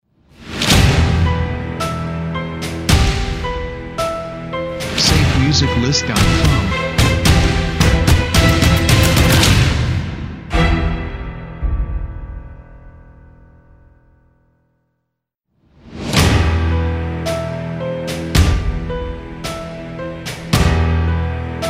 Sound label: Dark Action Cinematic Intros; SafeMusicList